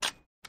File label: Single Camera Clicks